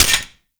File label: Shotgun Low Clip Mech; Veilbreaker; Kahl